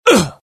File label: death, pain; mohaa; dialogue